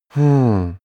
male sigh disappointed

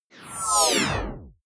screen shut down whoosh; energie

